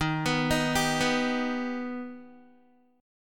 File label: D sharp Augmented D sharp Guitar; D#+ arpeggiated; 11,14,13,12,11,x